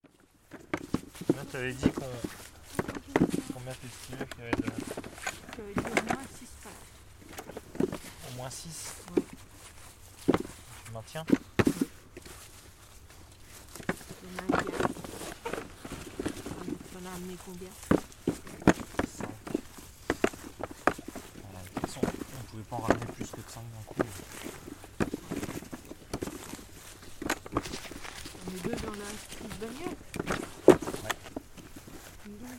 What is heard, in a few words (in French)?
ambiance amassage main